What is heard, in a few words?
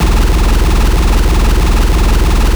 Snake Boss Charge Loop